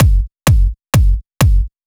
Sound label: Kick, 128 BPM; Vocal-Hook Kits